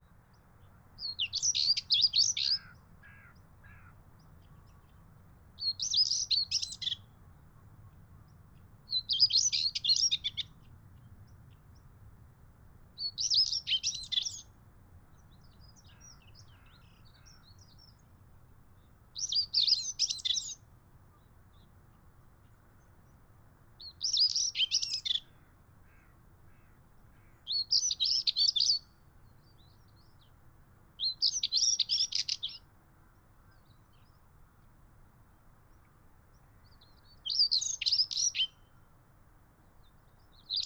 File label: European Stonechat Male Singing At Kennemerduinen Noord Holland Netherlands